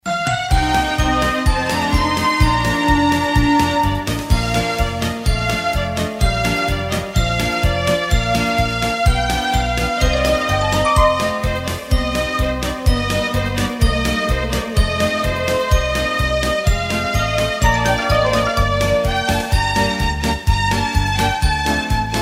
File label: music